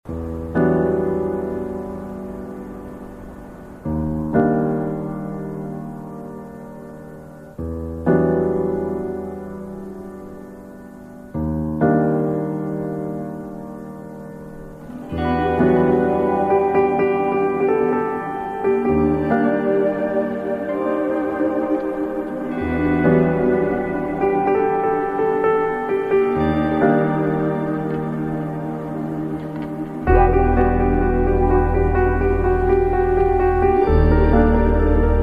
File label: Instrumental